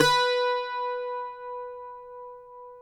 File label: CUATRO B 4, Guitars; Spectrasonics; Hans Zimmer Guitars VOL-2